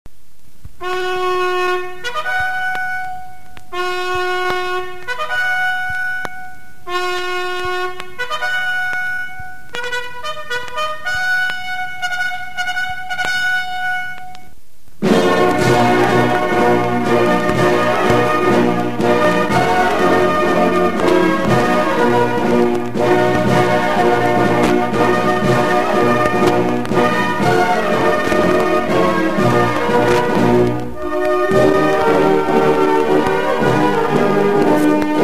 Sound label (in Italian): alzabandiera; Musiche di caserma